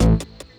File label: Bassy Boop; Weird